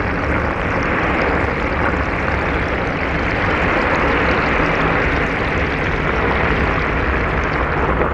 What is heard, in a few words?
shower